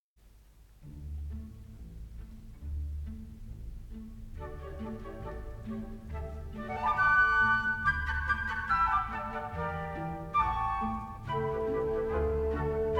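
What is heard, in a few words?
flute orchestra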